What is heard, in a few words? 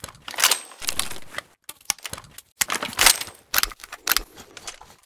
toz66 reload empty; bm16